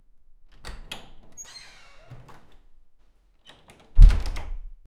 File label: door opens wooden door; doors